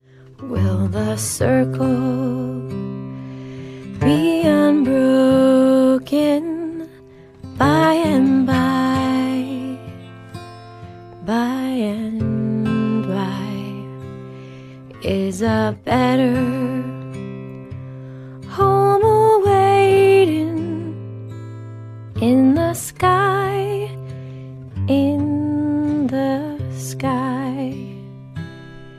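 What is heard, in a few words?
Is Calling R&B, ringtones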